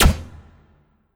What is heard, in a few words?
Turrets Shot; Legend of Keepers (gamerip) (2019)